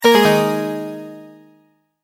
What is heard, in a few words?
multimedia tone end; Tones; Sound Design; McKinney Sound